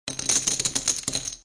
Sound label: Poker Chips Pile